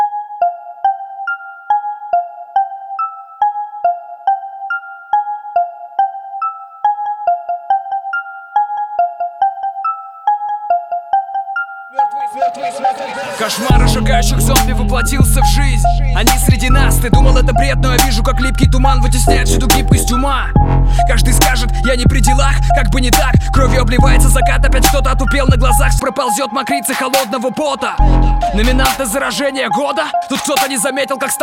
instrumental

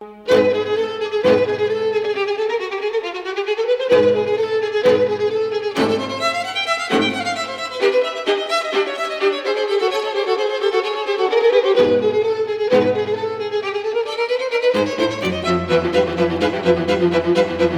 String Quartet Allegro molto; Dmitriy Dmitrievich Shostakovich; op110; Borodin Quartet

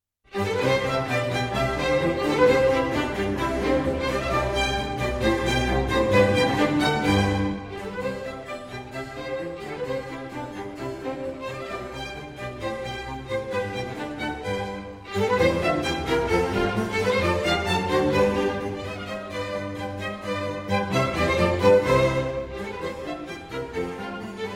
Sinfonia in C major Presto; Antonio Vivaldi

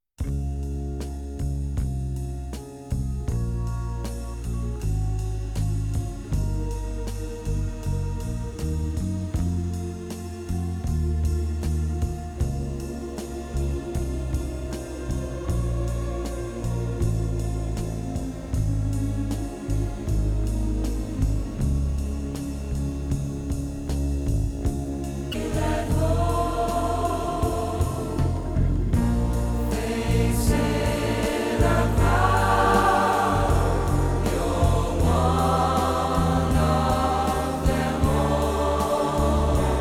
Choral

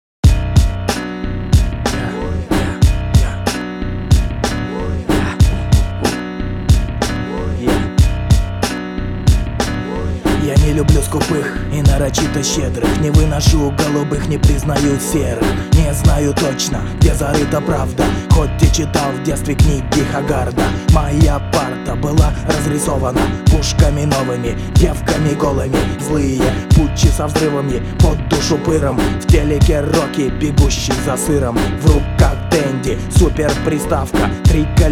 Hip hop

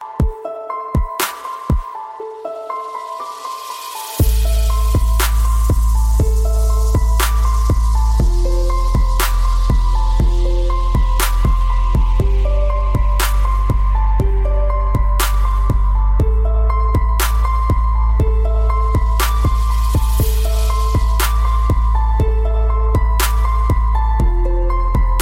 remix

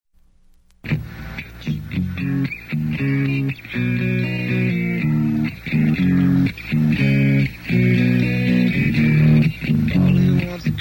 acoustic

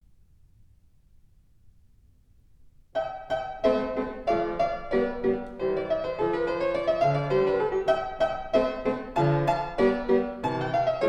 Piano Sonata in C Major Rondo; Alexei Lubimov; No 16; K 545

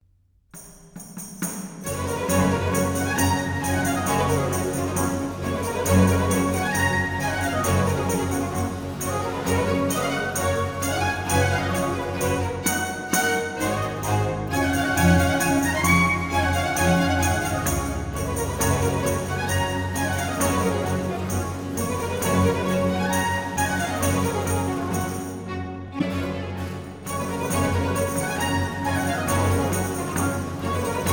Tambourin; Jean-Philippe Rameau

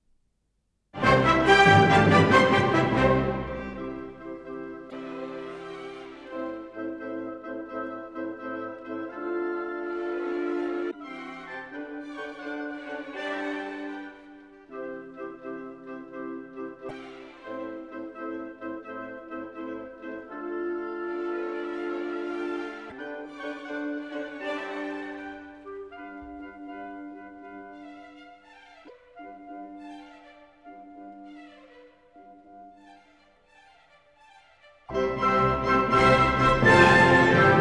Menuetto Allegro Molto; Symphony No 1 in C Major Op 21

